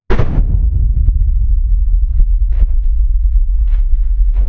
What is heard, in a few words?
alaska earthquake audio